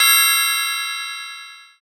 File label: ride, boom bap